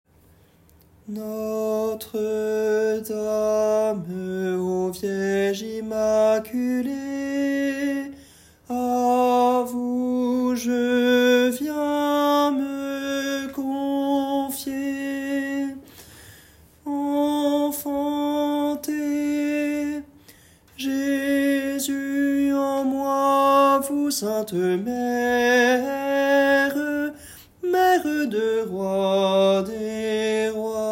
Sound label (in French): Chant du Jubile Refrain Tenor; sanctuaire